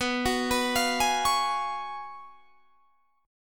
Ab Minor 13th, B, guitar chord

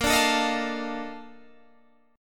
Bb Major 11th Bb down Guitar Standard; strummed; JGuitar

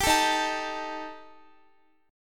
Eb Major Flat 5th Eb Guitar; EbMb5 strummed

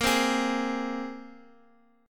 Bb 7th Suspended 2nd Bb Guitar Open D; x,x,x,4,3,6

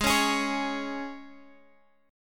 Db 5th Ab

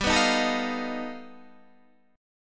G Augmented 9th, Guitar, Standard; G+9 strummed; 15,14,13,14,16,13; JGuitar

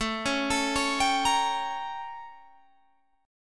A 7th Flat 9th A, Guitar Open G

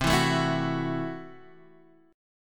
C 6th Flat 5th, C, down, Guitar, Half Step Down; chord

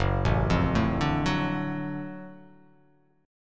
F sharp Minor Major 7th Sharp 5th F sharp down

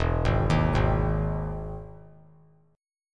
E Major 7th E down; EM7 arpeggiated; JGuitar

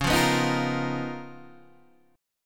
C sharp 13th C sharp, Guitar, Standard

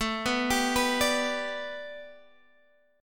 A 7th Suspended 2nd Suspended 4th Guitar Standard; A7sus2sus4 arpeggiated

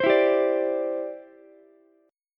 E Minor 7th Sharp 5th, Ukulele; strummed; 17,16,15,17; JGuitar

